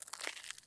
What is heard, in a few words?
walk asphalt; Escape from Tarkov